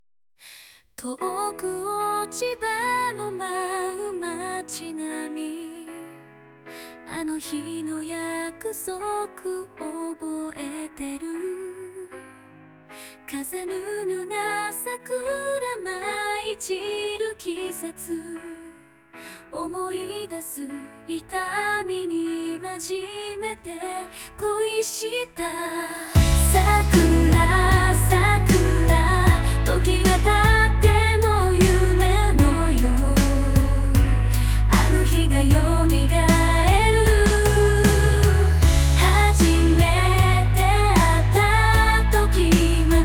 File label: Melody; Sakura